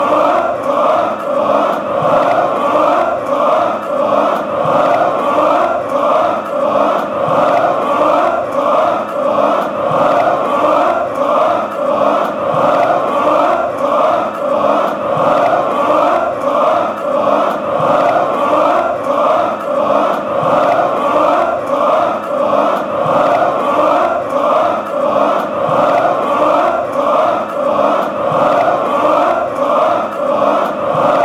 chant, fanchants; eintracht frankfurt

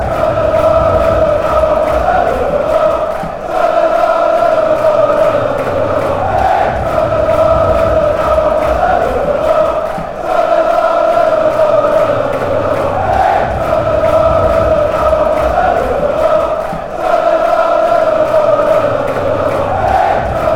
jinglebells, fanchants

